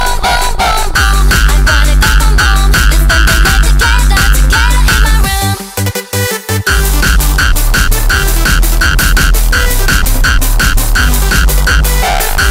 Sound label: HardTekk; Pop; ~ 30 сек.; Поп музыка